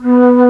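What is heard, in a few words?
TRON FLUTE, Vintage Instrument; E-MU Formula 4000 Series Vol. 5; Protozoa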